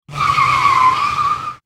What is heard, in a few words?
sliding, sliding tires; sliding car sound; teststrecke-game